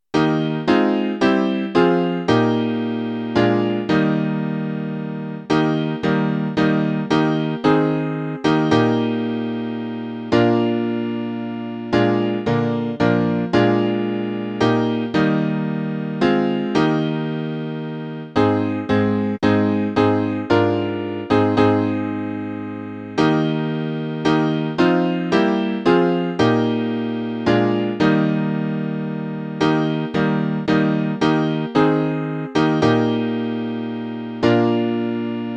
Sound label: piano; Whisper A Prayer